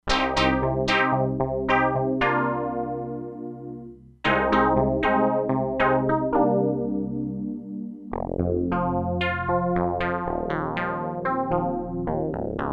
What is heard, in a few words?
VELOCITY CONTR. PULSE, Crumar Bit 99; Factory Programs